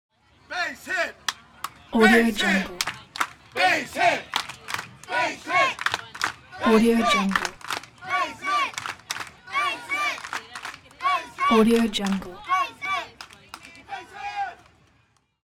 baseball game crowd cheering base hit

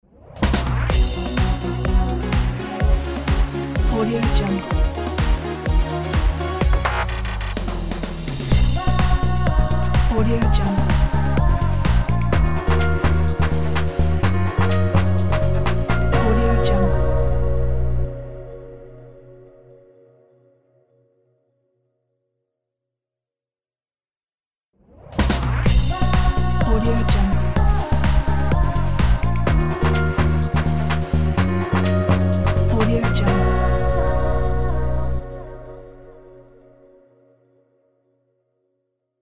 breeze logo

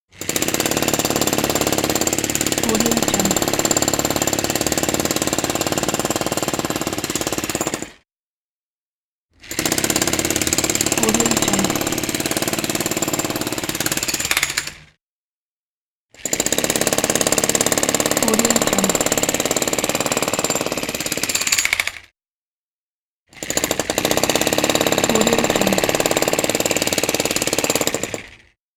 jackhammer; sounddivision

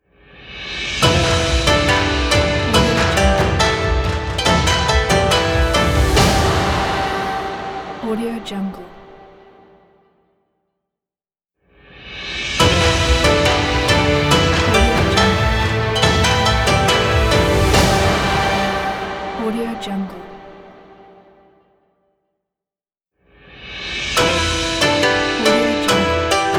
chinese logo

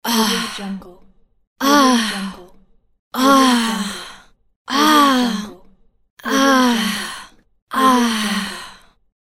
satisfied female

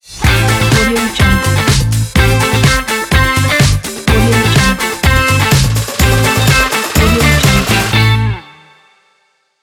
event promo logo

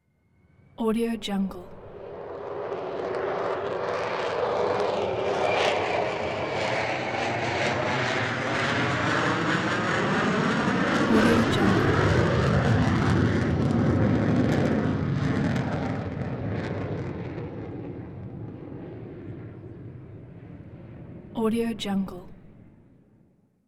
passenger plane flies overhead

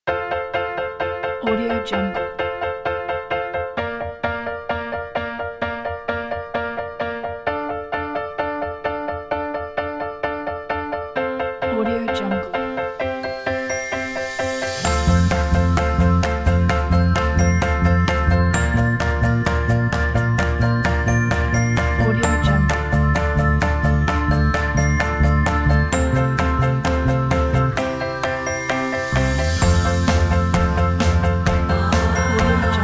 dreamer family days

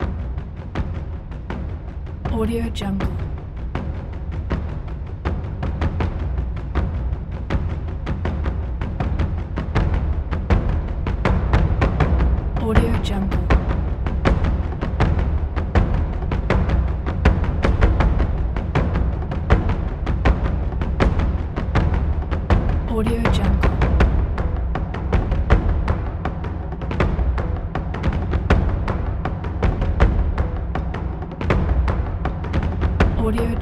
japan drum taiko cinematic

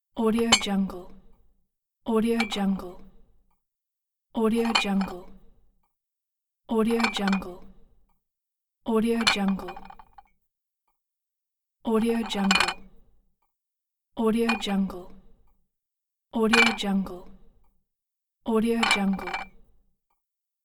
plate rattles

